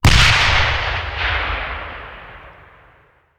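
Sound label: Artillery Grenade Launcher; Sound Ideas; Guns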